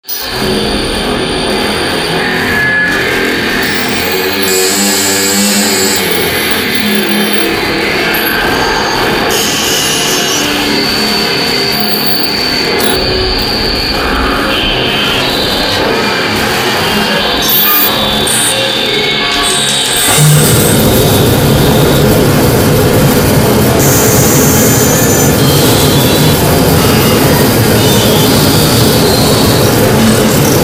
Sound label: glaze & scream